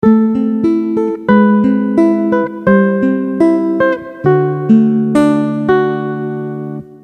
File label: VGA-7 Nylon Classic; roland